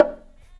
GATO; InVision Interactive; Percussion Slam

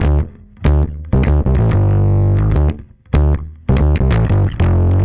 Bass, 95bpm, D, analogue circuit; musicradar

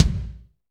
KIK, Drumscapes Roland, Pwr Ballad; Northstar - Drumscapes Roland

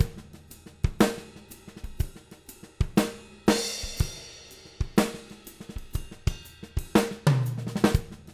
121 DOWN, DWNTWN, Living Drums; Sampleheads; Peter Erskine

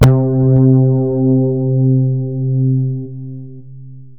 Synths BASS

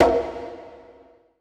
BONGO L; BPC DRUMS

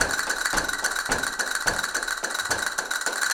Castanets, Flamenco; Best Service ProSamples vol.46; Percussion

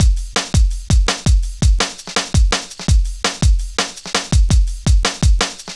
Drum Loops, Jungle, 166.5

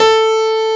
Big Band Fast; Roland L-CDC-01

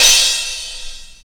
cymbal, disco; Best Service ProSamples vol.48 - Disco Fever; AKAI

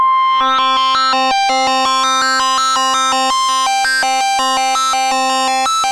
JUP 8 C5, JUPITER 8, Rare Synthesizer; Hollywood Edge - Giorgio Moroder Rare Synthesizer Collection

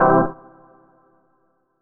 HOUSE, House Dance

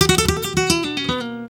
GUIT, Gipsy; Best Service; Ethnic Journey Sound Cube

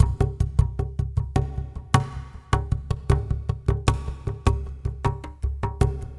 echo; Spectrasonics

